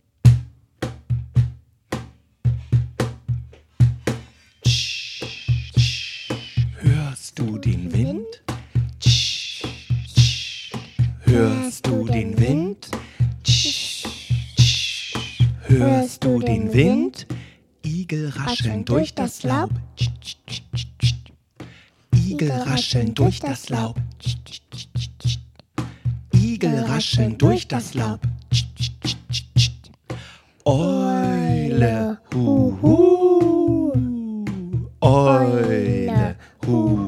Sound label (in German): Rhythmical Im Wald